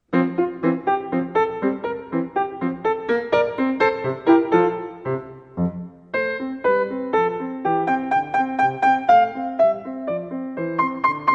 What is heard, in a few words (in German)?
Menuet II Coda; mozart